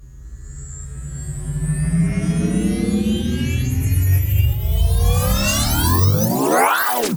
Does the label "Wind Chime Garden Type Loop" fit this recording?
No